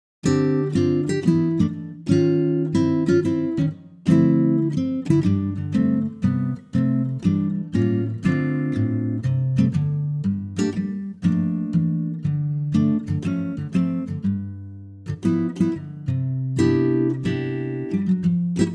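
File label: C acoustic guitar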